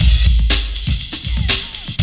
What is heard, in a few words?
BREAK, BREAKBEATS; Crypton - DrumBase5000